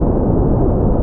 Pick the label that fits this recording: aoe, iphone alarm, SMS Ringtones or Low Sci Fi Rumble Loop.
Low Sci Fi Rumble Loop